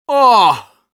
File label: Scout negative vocalization, ru; "Оооо!"; разведчиком